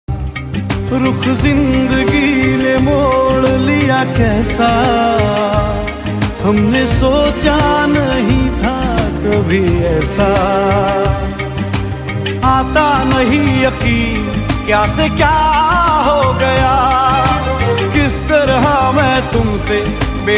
Sad Song

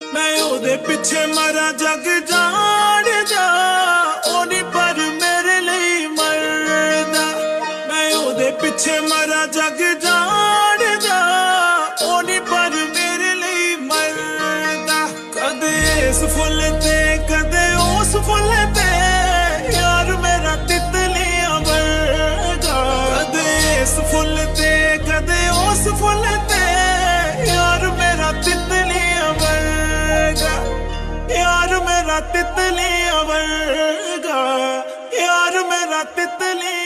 Male Version

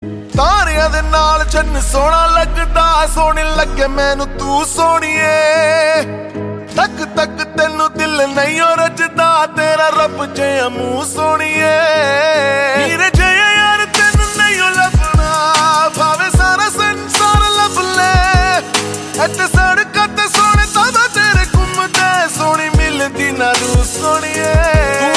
Song

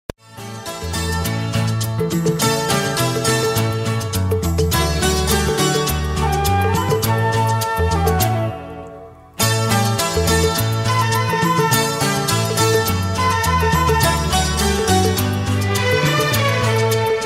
Instrumental Tone

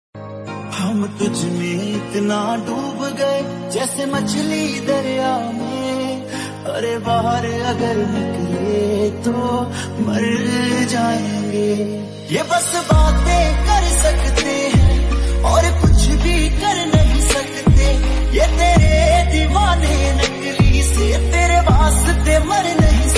Punjabi Song Ringtone

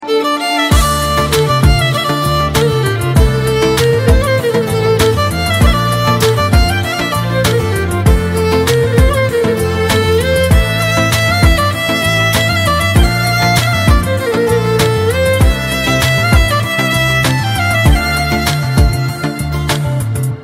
Song Instrumental Tone

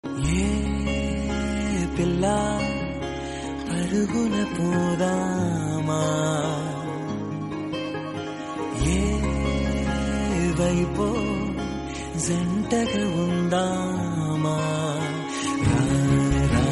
Top telugu love music

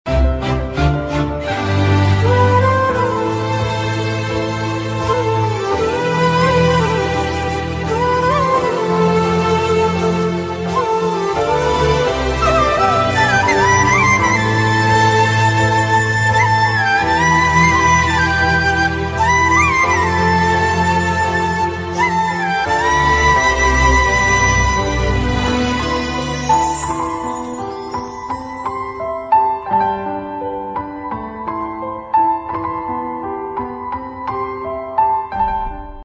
Telugu Sad Background Music

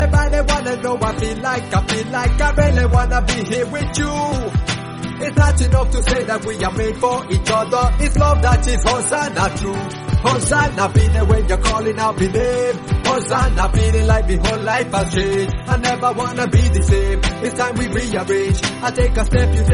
English Rap

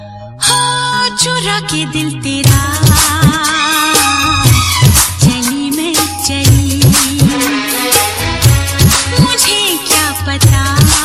Song Ringtone Female Version

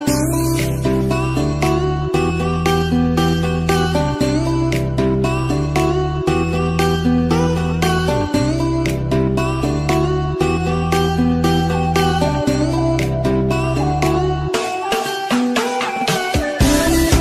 Music Ringtone

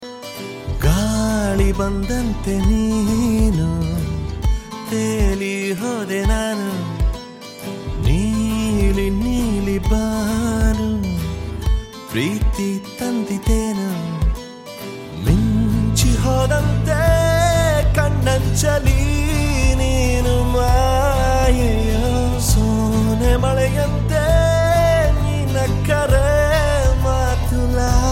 Song

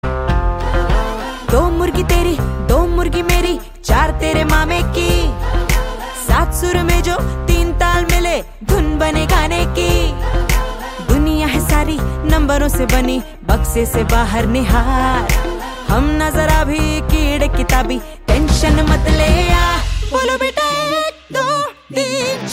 Song; Shakuntala Devi

